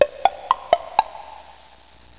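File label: TEMPLE BLOCK; Audio Oddities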